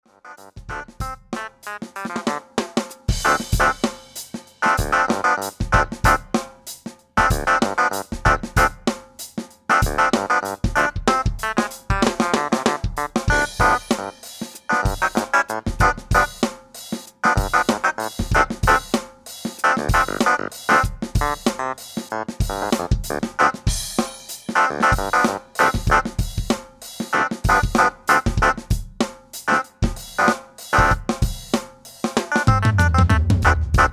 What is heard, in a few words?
Clavinet